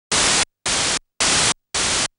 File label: noise 3dB two step; dBsteps 3dB